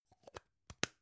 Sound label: gum plastic box close soft